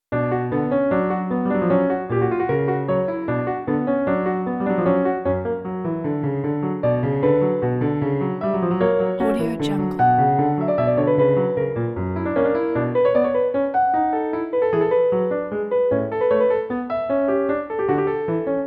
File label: bach prelude in b minor; wtc2